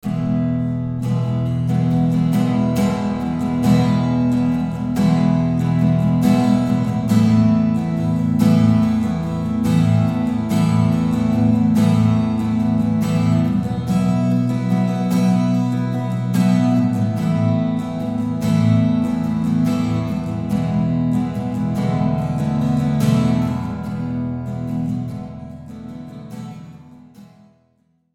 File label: long predelay